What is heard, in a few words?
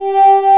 VO G3, ANA VOX, SYNTHESIZER; AKAI S6000 CD-ROM - Volume 1